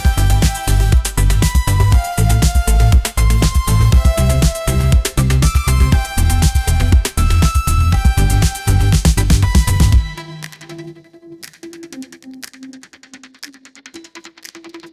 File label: anime sunshine pop groove, ringtones